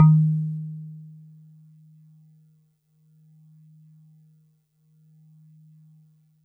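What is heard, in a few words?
Celeste DX100 D#1; Pristine Celeste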